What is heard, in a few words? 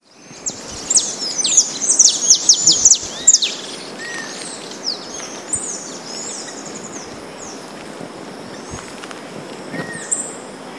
Sicalis flaveola; PN Itatiaia RJ; Silveira